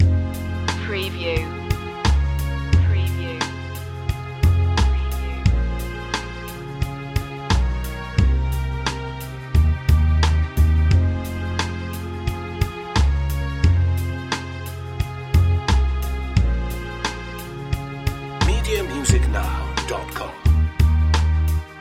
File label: jazzy vibe loop